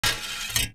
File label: metal spring fx; Cartoon - film effects